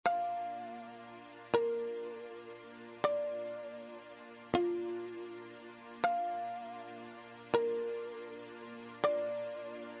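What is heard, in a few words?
calming music, drip; kapel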